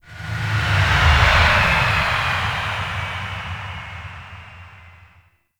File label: Drop Off, Vehicles Flight; Warframe; Liset; Stalker Liset Skin